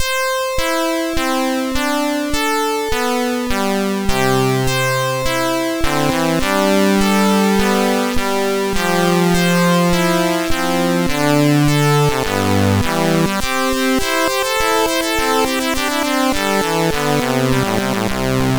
Super Synth Sample, sid MOS6581R3

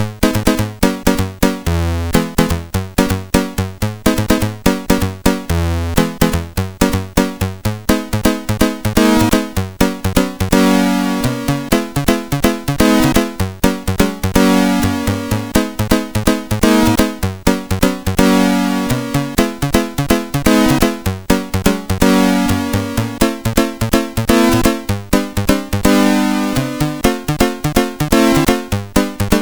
Piano-Rap II

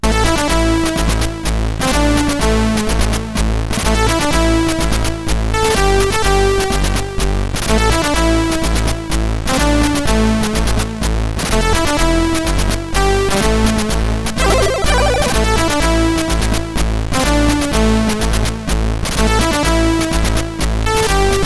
Musicbox

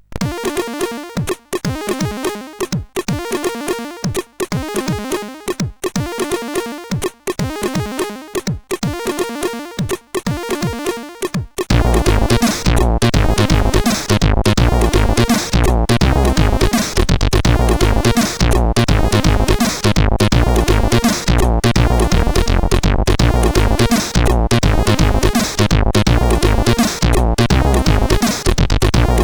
Shortfunk, sid, CSG8580R5

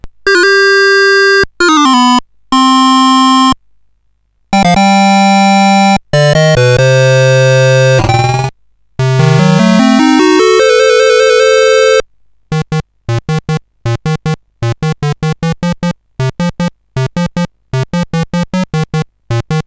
Musicbox, sid CSG8580R5

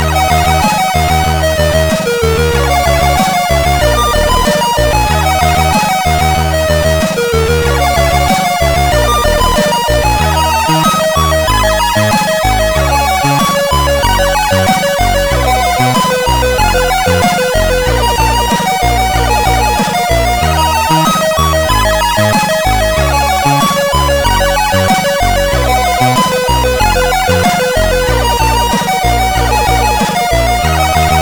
Magic Flute, CSG8580R5

